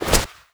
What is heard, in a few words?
shove; Rust